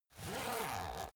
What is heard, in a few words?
zipper use